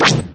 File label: x wing fire sound; lego star wars